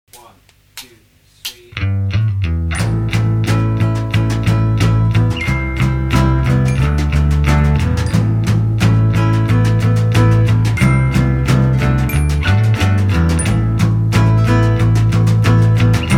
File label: Danger Surf Beach